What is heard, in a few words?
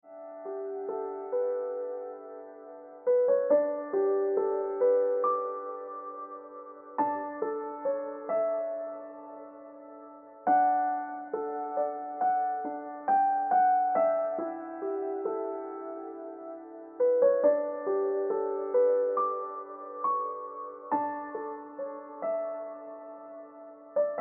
Ringtone Piano Music